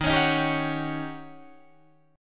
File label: Eb 7th Sharp 9th, Eb, Guitar, Open D